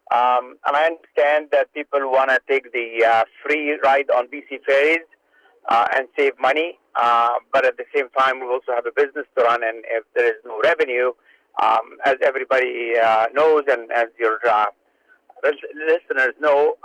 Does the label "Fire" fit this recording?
No